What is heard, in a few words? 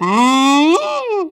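China flute; Spectrasonics; CHINAFLT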